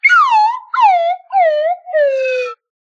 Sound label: slide whistle sad